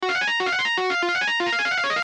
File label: singing